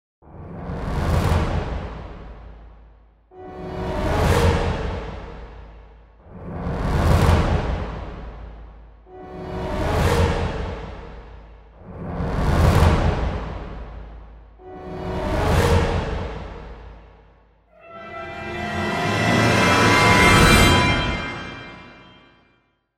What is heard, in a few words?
dramaturgy